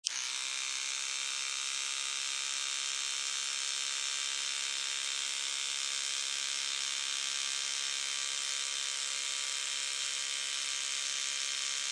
Electric Toothbrush Run Turn Off, Household, Electric Toothbrush, Turn On, Run, Turn Off; Bathroom